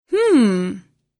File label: female hmmm